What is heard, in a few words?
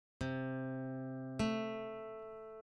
interval major sixth, guitar